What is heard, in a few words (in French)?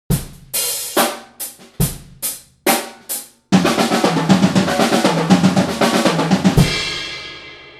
break sextolet tihai; passe partout